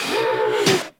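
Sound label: Research Facility Repair Robot Rotate; Warframe; Venus Open World; Objective Rooms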